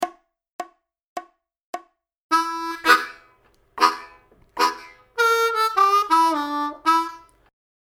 Fun Solo Chugging Licks Lick 105BPM, harmonica

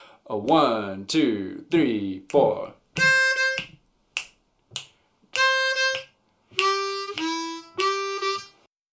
Lick Fast, harmonica

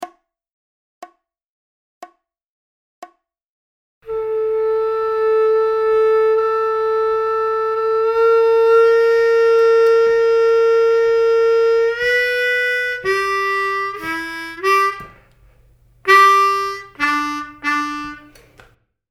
V IV I Licks 60BPM, harmonica

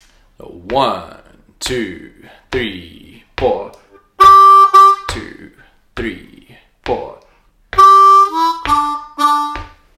Turnaround Lick, harmonica

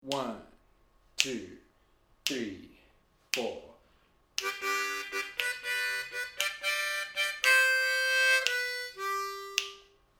Lick Slow, harmonica

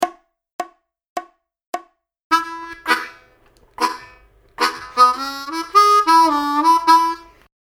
Fun Solo Chugging Licks Lick 105BPM, harmonica

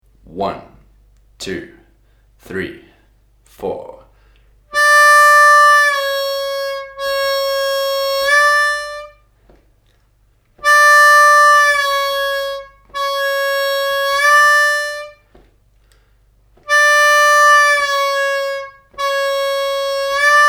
bends straight on exercise, harmonica

